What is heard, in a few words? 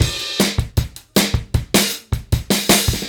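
155 bpm, beat loops; Zero-G; Monster Beats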